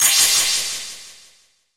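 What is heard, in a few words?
sound of a beam of light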